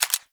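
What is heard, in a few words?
submachine gun Mech; Weapons; Warframe; Tenno; Sundial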